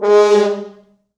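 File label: French Horns; East West; Quantum Leap Horns Sax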